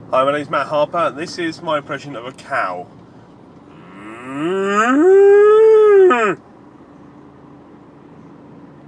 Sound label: cow